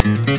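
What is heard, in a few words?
guitar string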